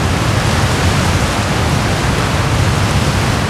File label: VENICE, Ocean & Brook; SFX